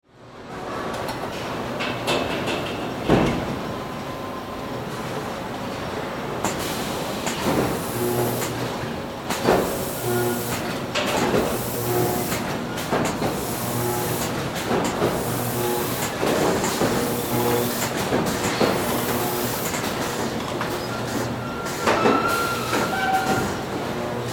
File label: Robotics Area, Industry, Factory, Cab, Assembly, Workers, Assembly, Plant; General, Motors; Factory Sound Effects; SoundEffects-BlastWave